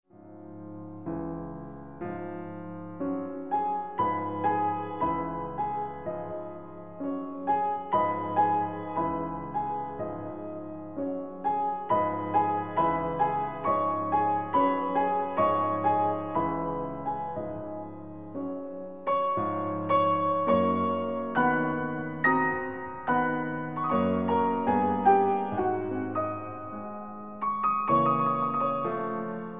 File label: Preludes; Gershwin